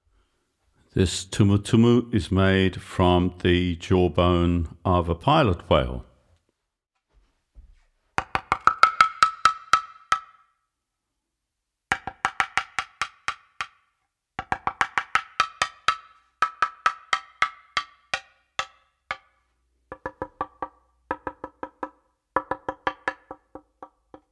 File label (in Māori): Tumutumu Whale